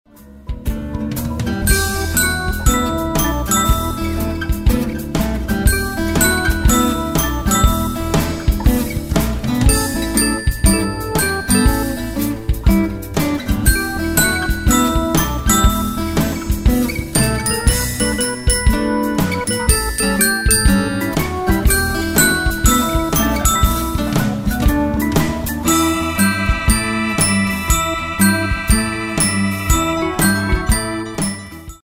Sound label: Blues